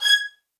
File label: MED ATT G# 7, soft violins; Q Up Arts; Denny Jaeger Master Violin Library